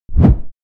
Futuristic transition

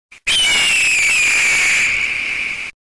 Earrape eagle screech; meme

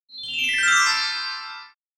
Cartoon flashback

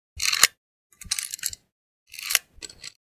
Pistol handgun semi automatic .45 load mag

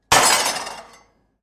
Various metal items drop

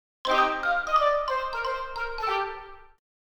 Game over you lose fun playful

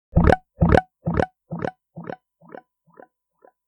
Electric guitar muted strings delay

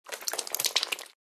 Disgusting wet vomit

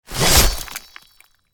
Guillotine blade drop impact hit; medieval

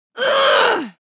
Woman grunting heavy effort

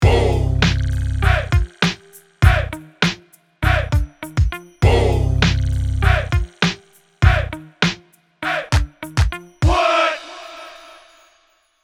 classic beat; social media

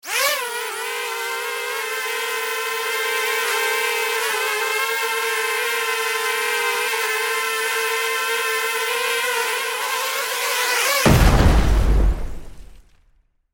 Military drone flight and explosion; style 2 long; misc explosions; gfxsounds

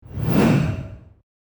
Infographic data chart zoom in

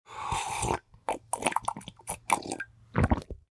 Sipping tea with a swallow

